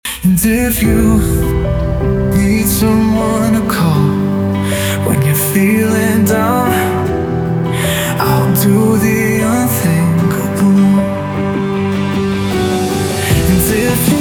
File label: Melodic Dubstep